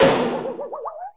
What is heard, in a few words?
bang wobble; Crash Bang Boom